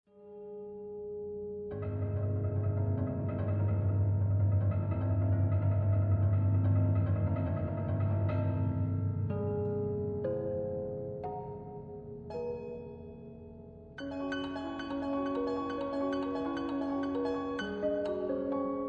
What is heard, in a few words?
prepared piano